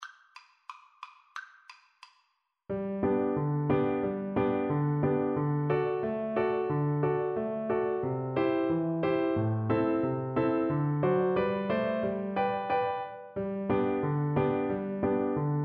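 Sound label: recorder karaoke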